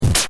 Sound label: attack sword